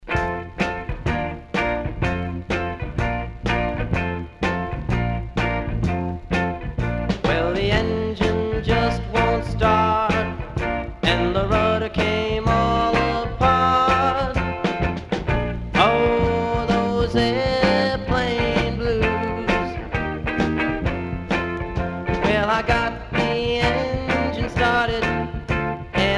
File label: Blues